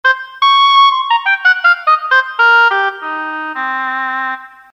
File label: oboe